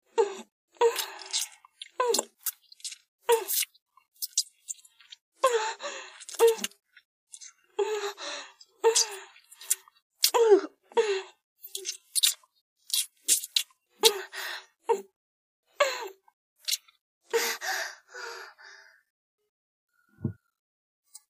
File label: kiss